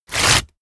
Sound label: sheet crumpled tear up fast